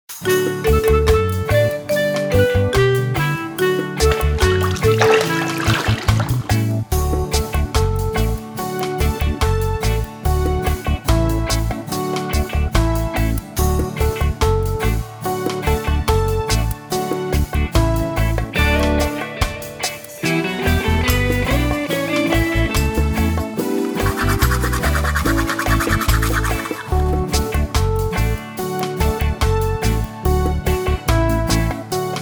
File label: Karaoke